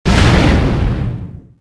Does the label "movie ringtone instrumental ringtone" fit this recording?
No